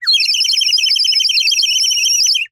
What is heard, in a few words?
Bird whistle, clay, painted, water, airy, wispy, flurry, very strong, burst, extended; Eiravaein Works; Vaeyan IV